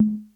CR78 conga; 90 sSampleCDs; Crypton - DrumBase5000

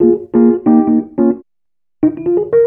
ACID JAZZ; Best Service; Advanced Media Trax Modern Composer

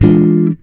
GTR CHORD; Univers Sons; Jazzistic

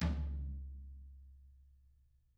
TAMA TOM HIT; ILIO; Double Platinum Drums 1

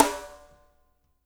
SHUFF SN, SNARES; Spectrasonics; Burning Grooves

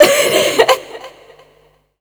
Dance Vocals, FEMALE

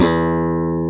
SNAP E3, bass; AKAI S6000 CD-ROM - Ultimate

